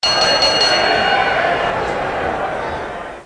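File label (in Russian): звон и звук группы людей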